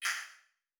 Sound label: swipe sound